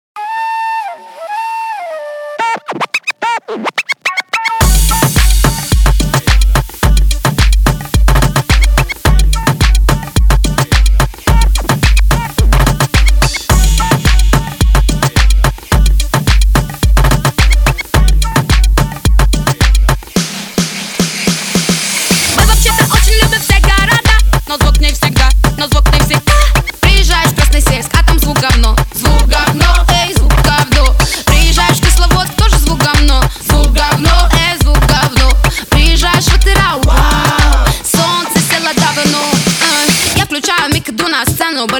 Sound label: moombahton